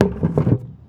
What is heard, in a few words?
Transit Center Bucket Perc; Houston; Northline